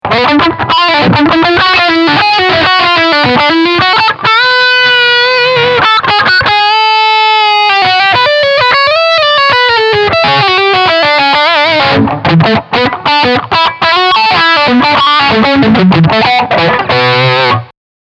leadline distortion

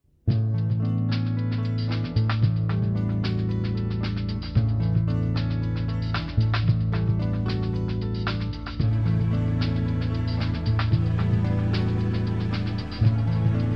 Fairchild 670 Song MS

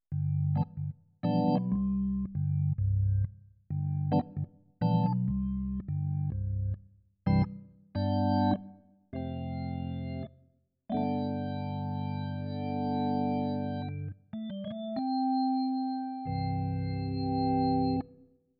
CN34 Organ

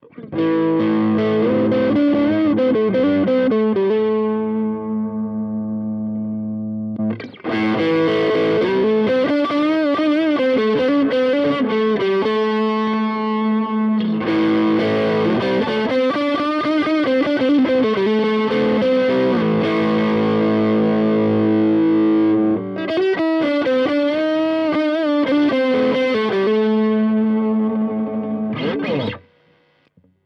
Fender Gain treble boost; Compadre

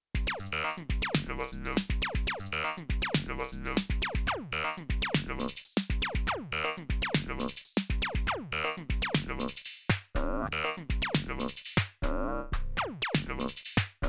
TE PO 137 Jam

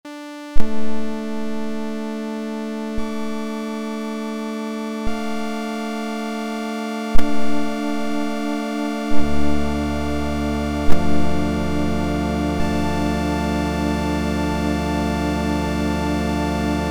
Krischer M8 Dm

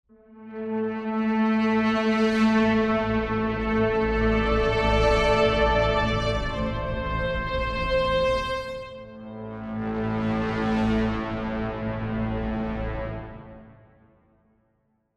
Hybrid Sweels Cresc

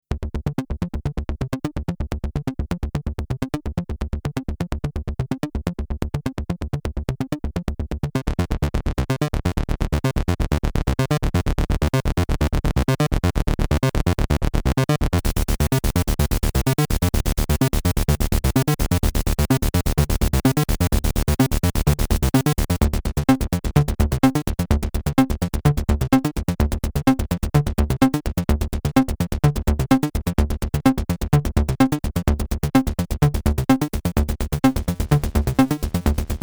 x0xb0x trig SH-09

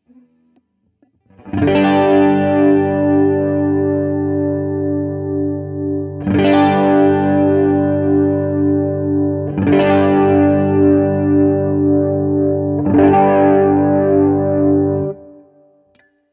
Gretsch ETMC LTD Pristine JET Tone Controls

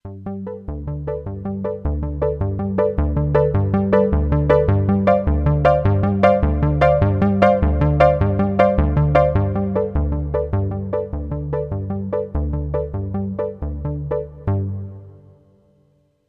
Pulse Piano

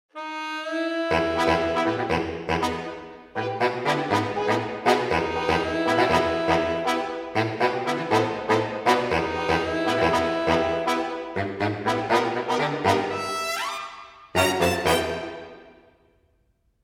Orchestral Mix; Orchestral Tools Glory Days

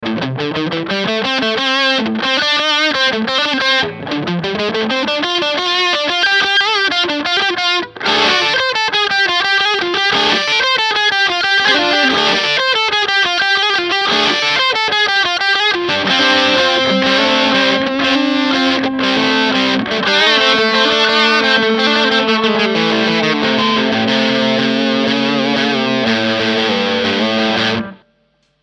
Ibanez lead

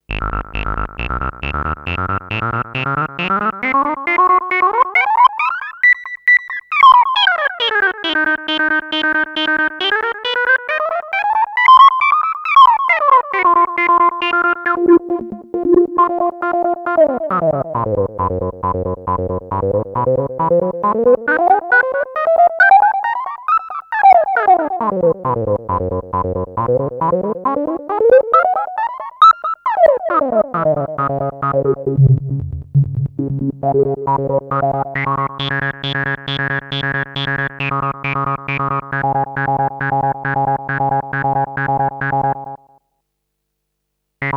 Toon Filter Dry to Wet

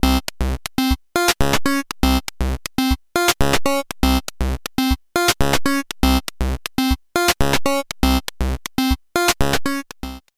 Buzzy Parameter Sequencing; Freds Lab

